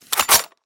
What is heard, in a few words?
mp5 switch, guns